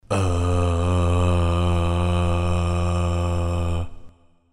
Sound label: dope yahhh